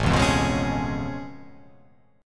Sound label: A Augmented Major 9th A down